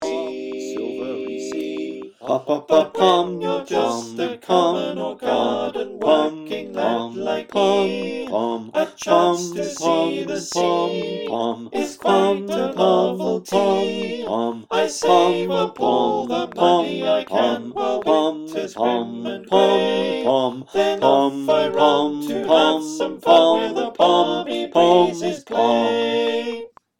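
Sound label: verse parts bass, choir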